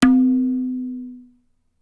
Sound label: tabla; Dirt-Samples